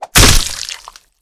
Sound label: kill chop